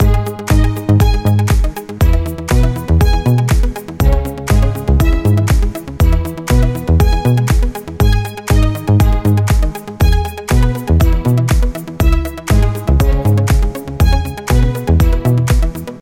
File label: Violin Ringtone